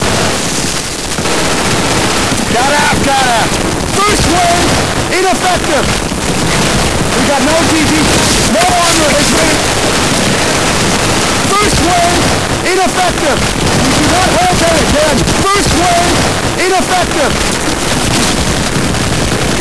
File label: thunder; mapsounds; thunder allies